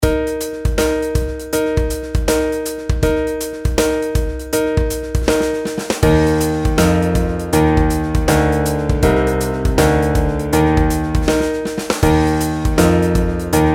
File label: pitch patterns stepwise